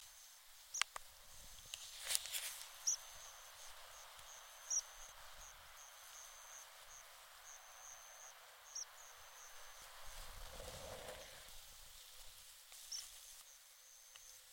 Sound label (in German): Bergpieper ssp. coutelli, Oman; Sohar-Sun-Farms